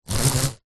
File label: household blind roller pull chord rub clicks